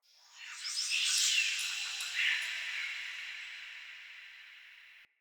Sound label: Day Texture, Ambience